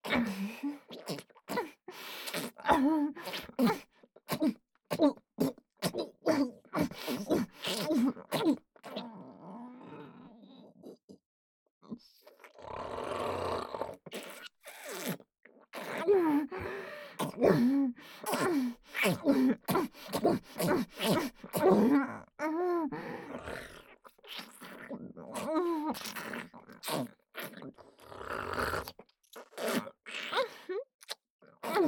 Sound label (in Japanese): 妖艶 喉奥フェラ; フェラ素材